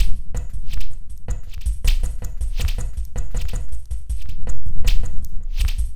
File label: Glitched, Processed Percussion